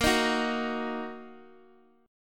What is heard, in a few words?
Bb Suspended 4th Sharp 5th, Bb, down, Guitar, Standard; Bbsus4#5 strummed; JGuitar